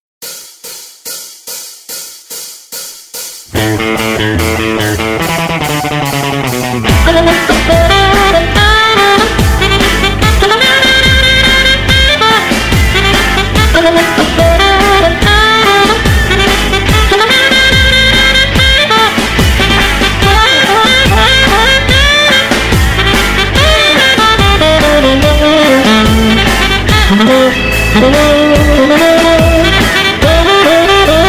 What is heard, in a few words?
Character Theme Instrumental